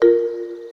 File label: Ding A#4; 音MAD; 转生成为了只有乙女游戏破灭Flag的邪恶大小姐